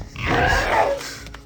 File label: raptor hurt